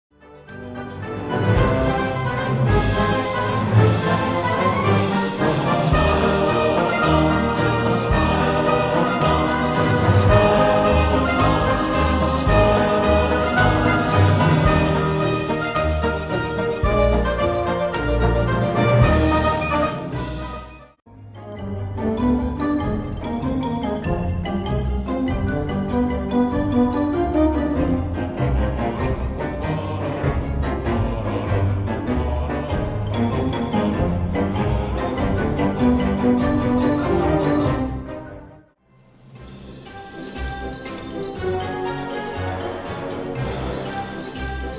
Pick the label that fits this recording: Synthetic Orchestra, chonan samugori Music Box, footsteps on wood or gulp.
Synthetic Orchestra